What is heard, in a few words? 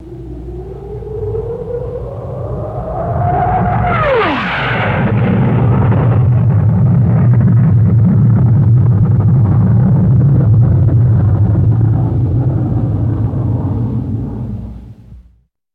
F86 Pass By Over Mic, f 86 sabre